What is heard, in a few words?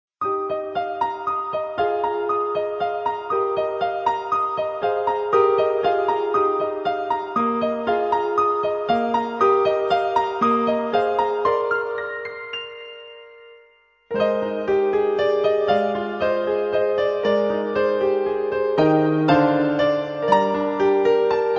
holy spirit piano; stella deus arrange